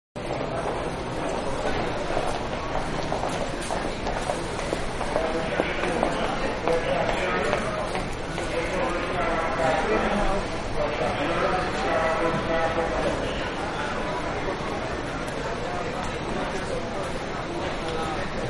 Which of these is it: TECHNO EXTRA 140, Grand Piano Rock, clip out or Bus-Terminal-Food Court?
Bus-Terminal-Food Court